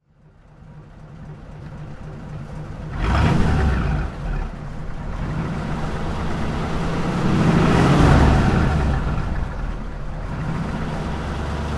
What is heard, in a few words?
ford V8 pickup throttle